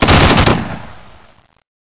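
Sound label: weapon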